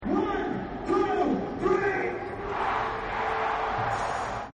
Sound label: Yells Crowd; Crowds; Backgrounds